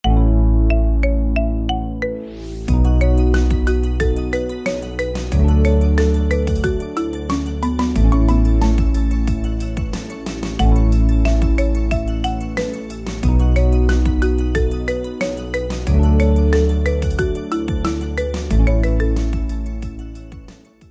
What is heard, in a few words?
Marimba Cover